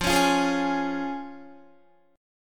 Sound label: F Minor Sharp 5th, Guitar, chord; strummed